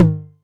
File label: Tom Hi, Novation Drumstation; DrumstationV1